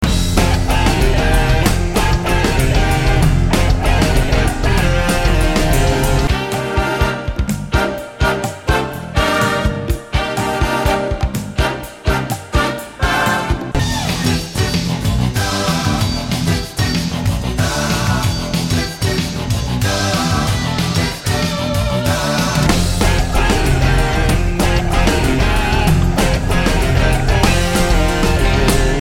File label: blues